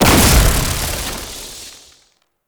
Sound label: Alchemy Grenade Explosion Electricity; Entrati